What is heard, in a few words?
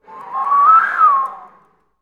FL FX, Advanced Orchestra; Best Service; Peter Siedlaczek's Advanced Orchestra